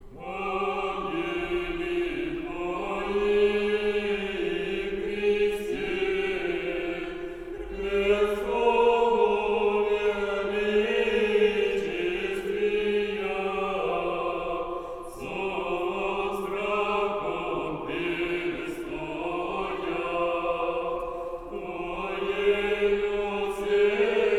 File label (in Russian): Стихера по 50 псалме; на Собор Архангела Михаила; Ангели Твои Хрис